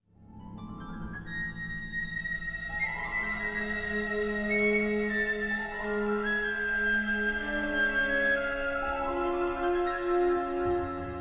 Suspense; Star Wars Obi-Wan

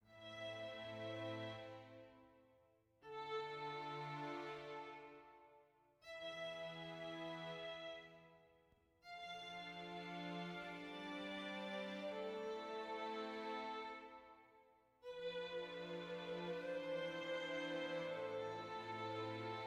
sad soft

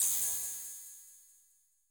Screenflash; Super Mombo Quest

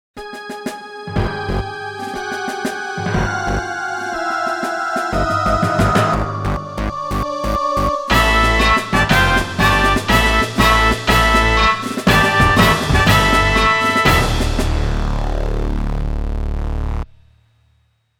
Loading; Stray Sheep

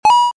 Powerpellet Pick

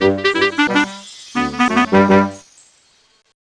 Jingle Level Complete; rayman raving rabbids gba gamerip 2006

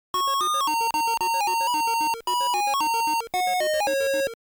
Power-Up; ROUTE16; Legend of Game Music 2 Platinum Box

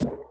Collision small

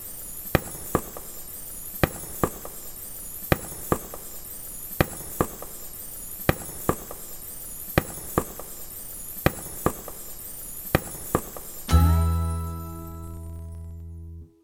Nugget Discovery Loop End; The Oregon Trail American Settler

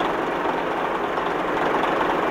Boulet Engine loop

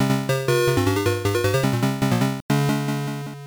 Round Start; Jail Break

